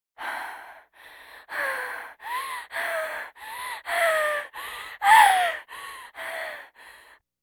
Vocal effects breathing Moaning Baby

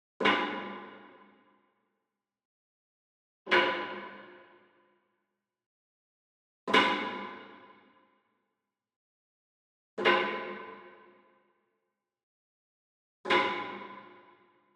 Wood Percussion Log Drum Flam Far; boom library; Cinematic Strikes Construction Kit

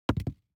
DICE on Neoprene, Throw and Roll, Foam, One Die; Samples; ArticulatedSounds; DICE Sfx Party (Extended)

